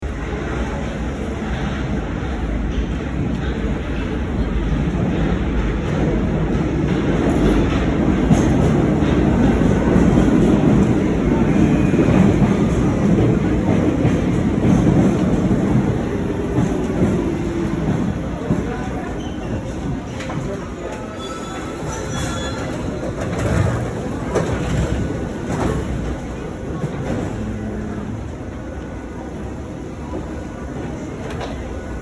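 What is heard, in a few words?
metro approaching boarding alighting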